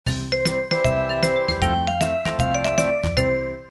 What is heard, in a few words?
message alert